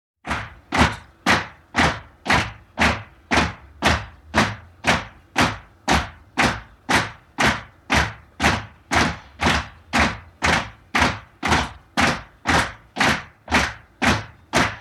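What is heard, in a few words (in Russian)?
Прыжки на месте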